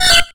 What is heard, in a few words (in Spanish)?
Grito de Sentret